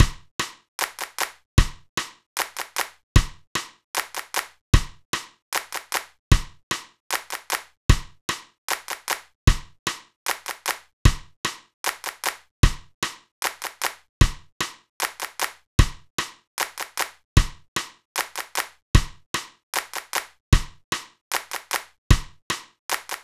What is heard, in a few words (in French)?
plinn, rythmes